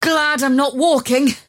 move pain; dota; mirana

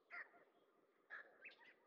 monkeys; dota

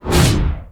melee ring; terrorblade

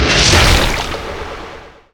coup de grace; weapons; dota; phantom assassin

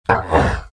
pain, vo; arc warden

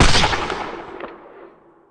attack; ult; muerta

